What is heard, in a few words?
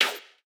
dash